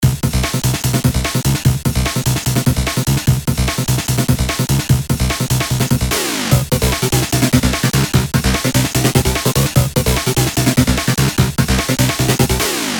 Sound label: amen; amen only